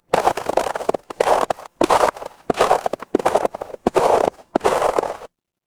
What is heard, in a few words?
footsteps snow